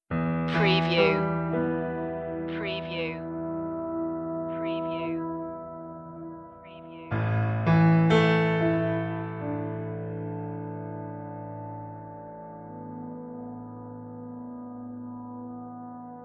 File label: cold wind